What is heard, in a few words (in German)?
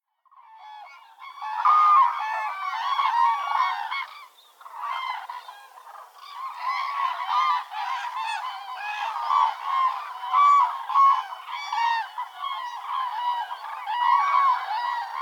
Kranich Grus grus, Ostsee; Vogelstimmen